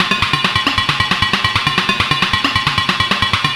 Percussive

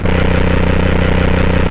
RUMBLE, Hard & Loud Techno; USB Soundscan vol.01; AKAI

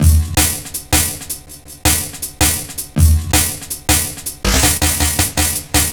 Stretcher 162, Drum Loops, Jungle; Jungle Warfare 1&2

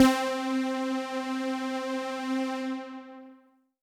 Synth C, Synth Prophet One Shots; Electronisounds

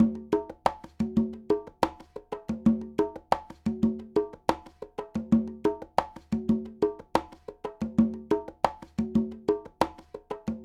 Conga, Latin Percussion

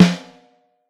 HHT, vintage snare drum; Q Up Arts; Johnny.C's Vintage Snare Drum

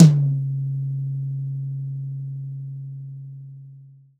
Dry Tom Hi, Roland JV-2080

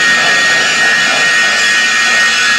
STORM; Northstar; The Wizard VOL-1; TERROSTROIKA

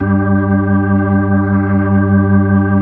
B-3 C306 55 L, Keyboards of The 60's and 70's; Full Xfade

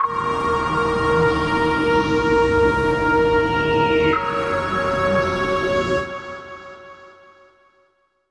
Ripple String, Musical FX; Zero-G Smoke

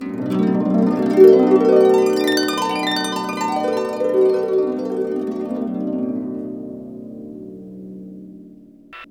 HRP GLISS; Roland - LA Composer vol. 1

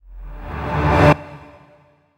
DANCE FX

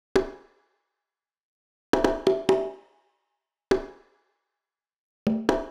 Beat - Congas, 135bpm; Zero-G Sound Sense Grime Scene; GS Construction Kits; The Hoodrat

